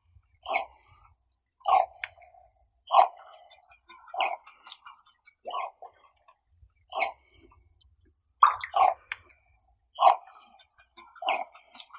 baklan ushastii phalacrocorax auritus

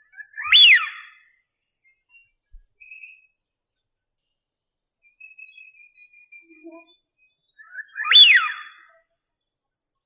zvuki prirodi sviyaz anas penelope

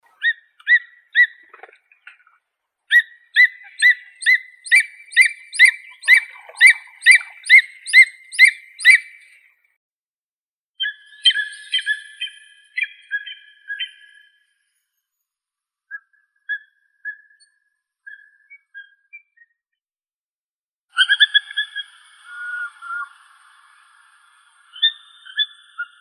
podorlik bolshoi aquila clanga; zvuki prirodi

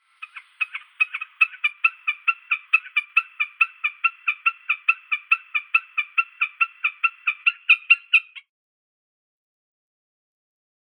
bekas gallinago gallinago

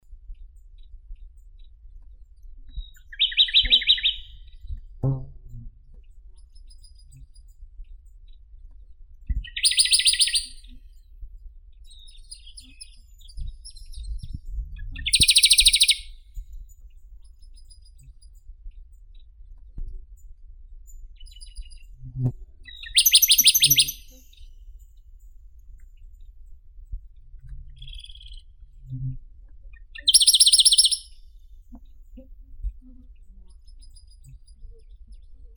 penochka tolstokluvaya phylloscopus schwarzi

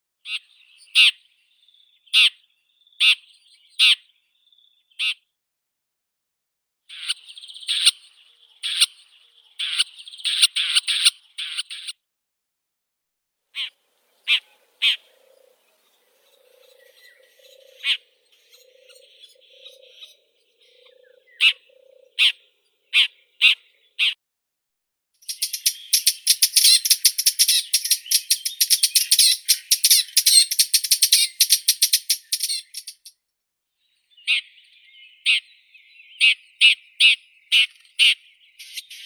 zhulan obiknovennii lanius collurio, zvuki prirodi

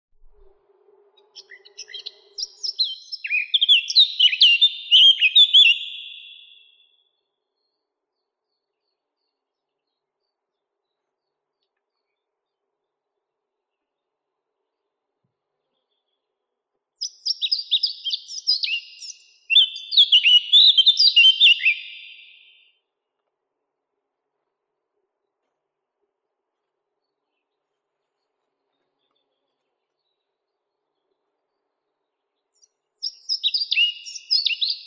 slavka chernogolovka sylvia atricapilla, zvuki prirodi

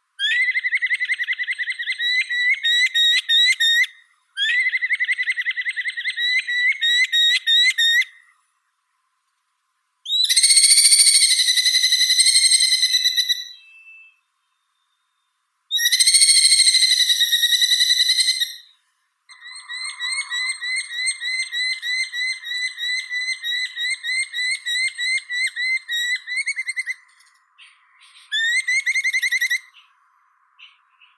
derbnik falco columbarius

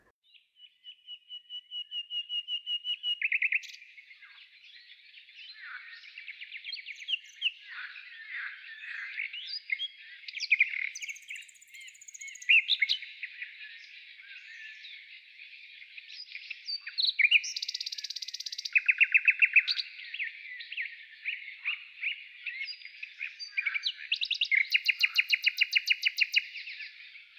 zvuki prirodi solovei s lyagushkami

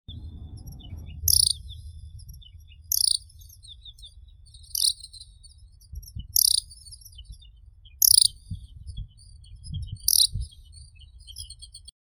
zhavoronok yaponskii alauda japonica, zvuki prirodi

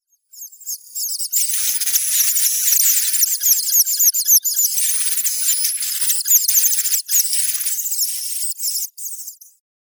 udod upupa epops ptenets, zvuki prirodi

